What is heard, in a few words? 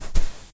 Footsteps footstep sand run; ProSoundCollection v1 3 Footsteps